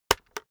keyboard external enter hit slow

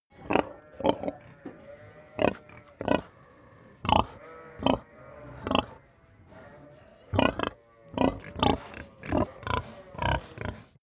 pig grunt crowd calm

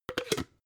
jar glass plastic close cap

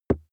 plastic cream bottle hit

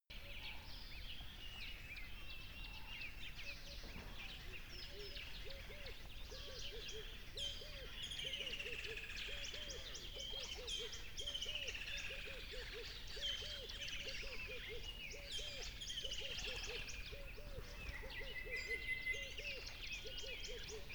lively tropical river crickets

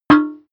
paint metal tin dent

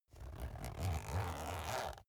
zipper use slow speed up

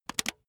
razor attachment retract hard

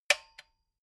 metal button stereo spring click medium

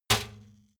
trash basket metal hit

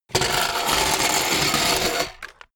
bmx bike grind metal

